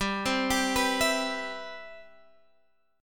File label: C Major 7th, G, guitar chord